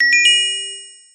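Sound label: Triple Ding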